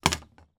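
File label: impact wood bamboo cane drop on wooden surface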